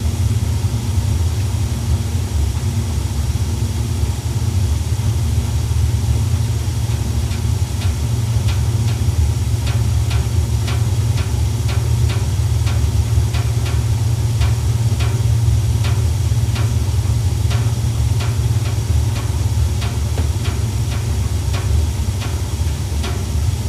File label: Gas Furnace Idle Lower